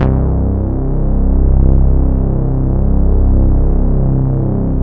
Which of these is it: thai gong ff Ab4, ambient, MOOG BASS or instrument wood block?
MOOG BASS